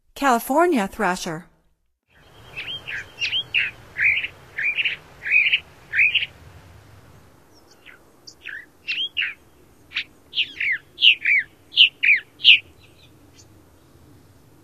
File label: California Thrasher, Songs of Birds of North America; Stokes